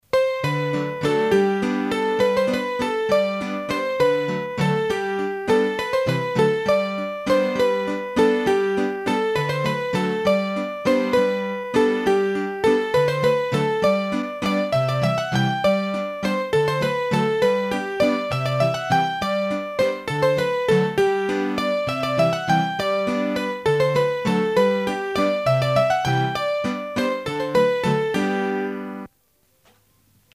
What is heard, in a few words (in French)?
Bourree de St Pierre, hurdy gurdy; trad; Massif Central; Bourrees a 3 Temp